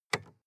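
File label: sports car interior lightswitch dull; verkehr transport; autos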